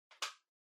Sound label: Portable MD Far Controller Plug In